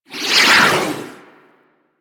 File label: Doom Sword Projectile By; Warframe; Excalibur